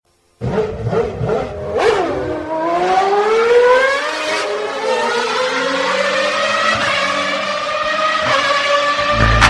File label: ferrari tone; ringtones; sms